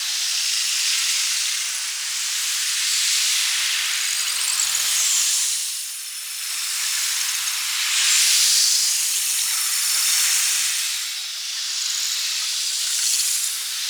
Flower Pollen Energy Loop; Warframe; Tau; Ambience

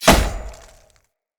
Great Sword Melee Impact Wood; Weapon Impacts; Player; Swords; Environment; Founders Great Sword

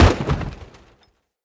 Bow Ranged Arrow Shatter; Weapons; Envoy Hunter Bow

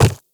Quadruped Medium Walk Default, Footsteps

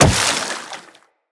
Wall Jump Water, Footsteps; Wall Run; Characters; Tenno

